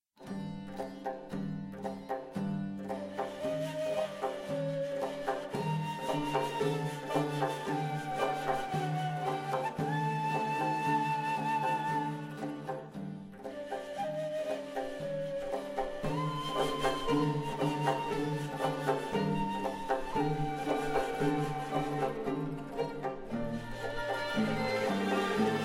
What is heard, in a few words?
Kurd Song Instrumental